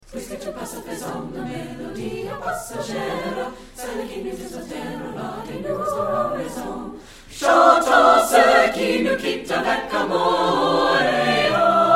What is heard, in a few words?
Choir - Puisque Tout Passe; 2003 Deering Spring Concert; (Hindemith)